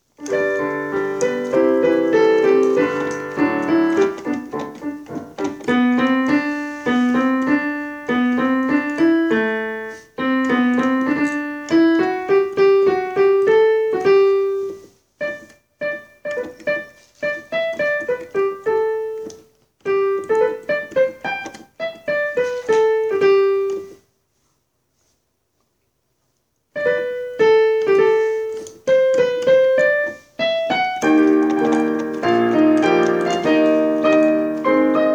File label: Nelly Bly Soprano, Accompaniments